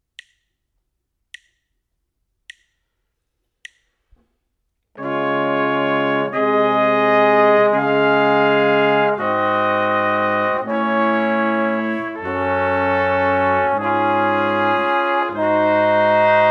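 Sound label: harmonic dictation, brass quartet; ear training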